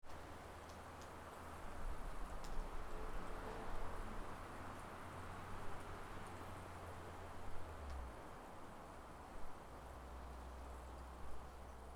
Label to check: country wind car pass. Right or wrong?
Right